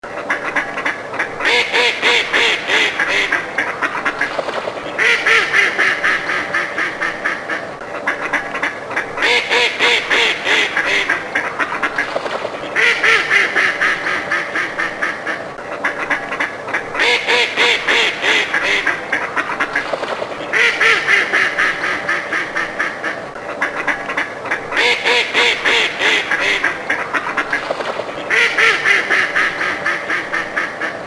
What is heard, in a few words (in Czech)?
Kachna divoka